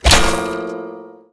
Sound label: chargebow; hl2 weapon